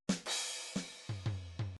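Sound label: midi drum patterns drums jazz swing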